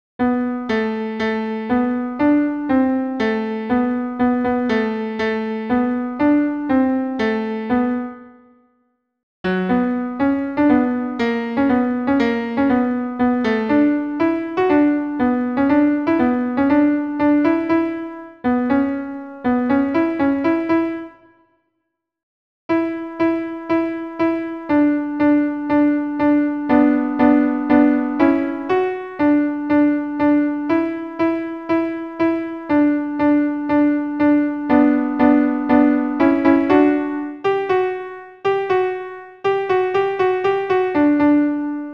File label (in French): ten pian seul